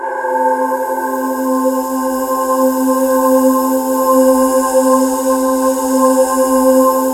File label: JV feedback, JV-1080; Syntec; Wall of Sounds